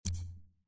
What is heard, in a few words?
dice bet